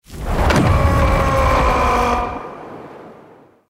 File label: win; Champions of Poseidon; neptune